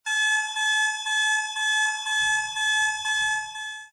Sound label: Firearm